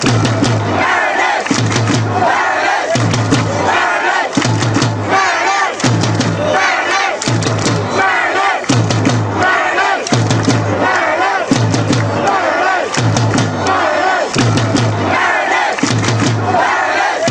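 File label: clap clap, football fan chants; mariners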